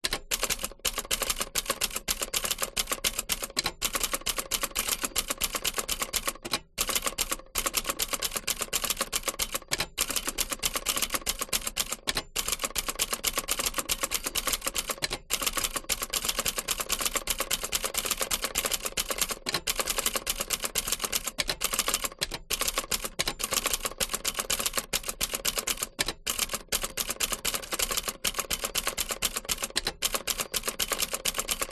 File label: office typewriter typing medium rear close vintage 1960 Lemair Helvetia